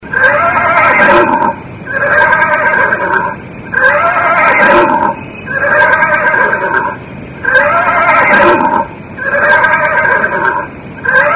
Tiếng ngựa hí; nhac dong vat

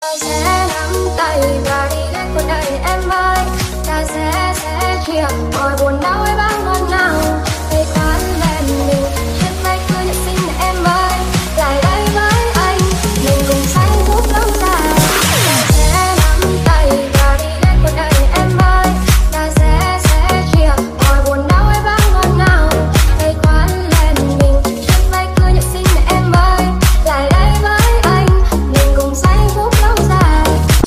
Nhac Chuong Remix Giong Nu Cover

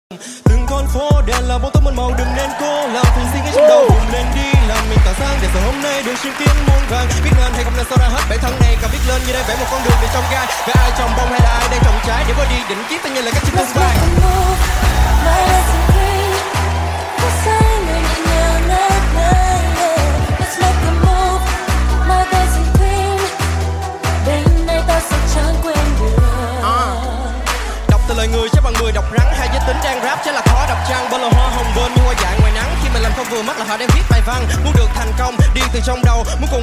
Nhac Chuong Rap Viet TikTok

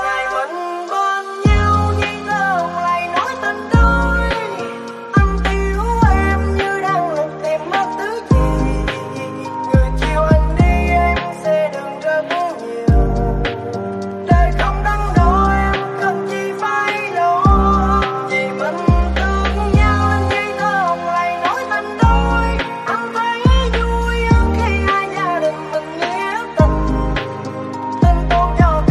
Nhac Chuong Lofi

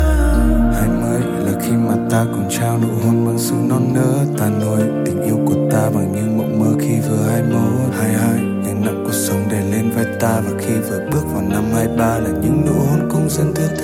nhạc chuông rap

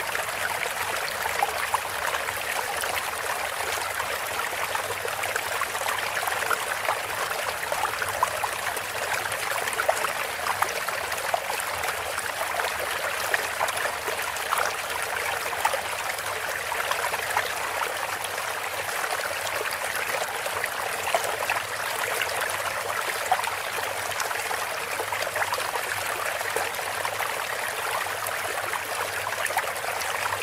Tieng suoi chay roc rach

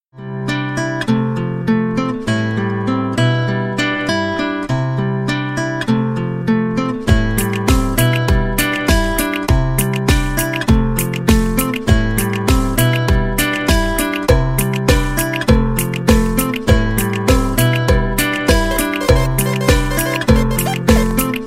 Nhac Chuong Nhe Nhang Cho iPhone

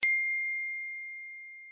tin nhắn iphone pink

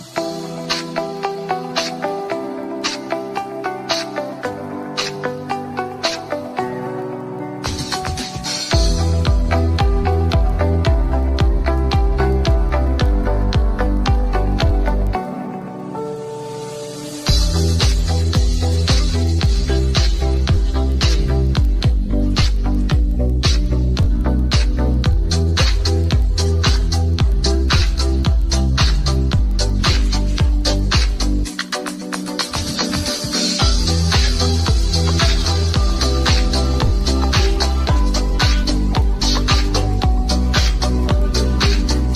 nhac chuong instrumental

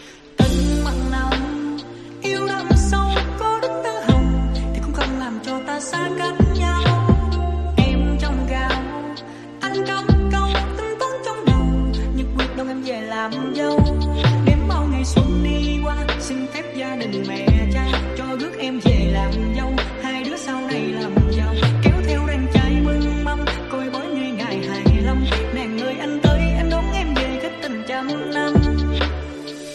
Nhac Chuong Lofi Ver

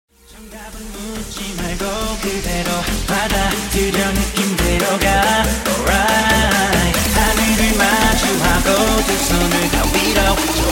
nhạc chuông remix; TikTok

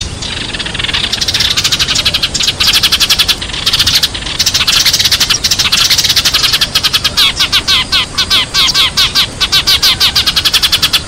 Tieng Se

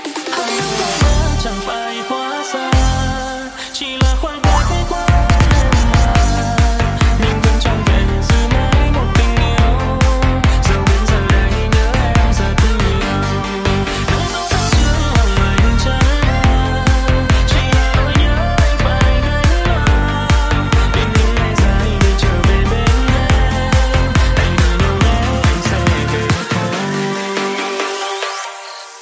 Nhac Chuong Remix

